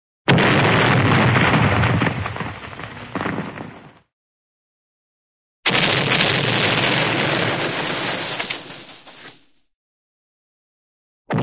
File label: explosion large explosion; cartoon